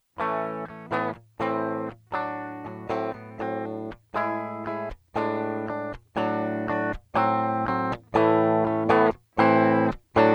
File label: reamp plus zero swell limit